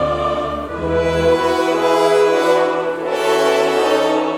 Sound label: Chorus; Chorus Of The Oppressed